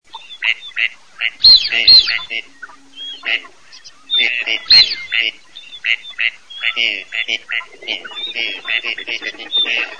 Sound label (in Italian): canapiglia fisc maschio